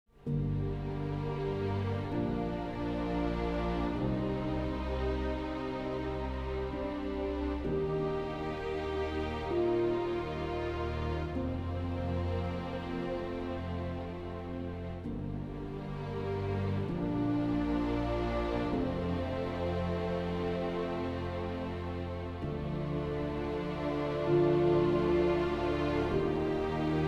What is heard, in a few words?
string theme naked